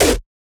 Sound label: kick